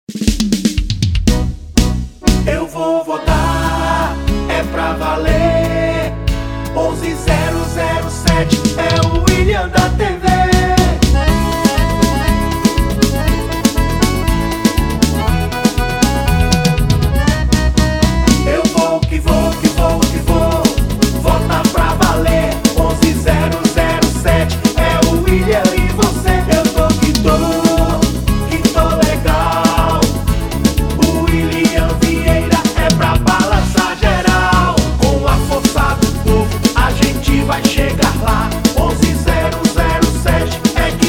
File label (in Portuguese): Jingle Parodia